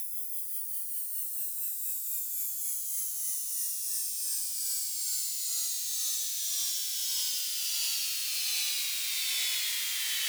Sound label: Tension Noise Down - 128 BPM, White Noise; FX; House